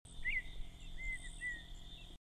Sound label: Rhynchotus rufescens